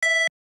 dial tone es star; Oppo Neo 5

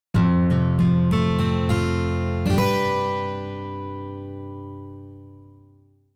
guitar

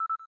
cam timer last; LG; X power K220

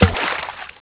turtle splash; Nokia; 5800 XpressMusic; Bounce Touch

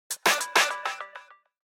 yep, notifications; HTC One-X

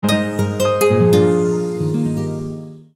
Tranquil alarm; Nokia; Audio themes

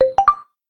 Pebble, notifications; LG; SU870 Optimus 3D Cube

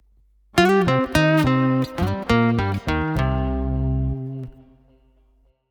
Guitar B; Kodak Smartway L2; ringtones

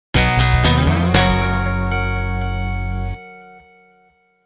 Message, phone tone; Nokia; 2700 classic

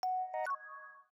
Cube rising up; Samsung SCH-M710 T Omnia II

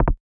Lock Cover; LG G Vista VS880

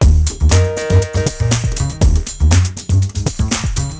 Funkerific; Siemens; CF75

